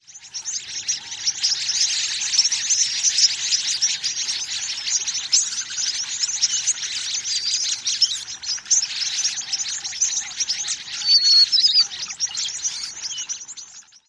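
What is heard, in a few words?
calls of large flock, White-crowned Sparrow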